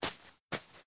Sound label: walk, Ghar Basilisk; Fate The Cursed King; MONSTERS